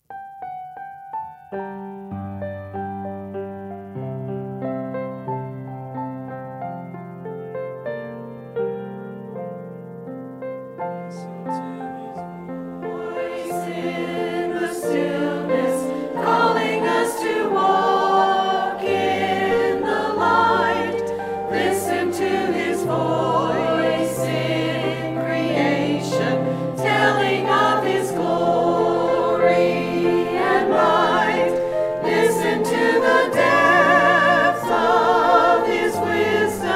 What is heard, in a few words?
Choir; calvaryministries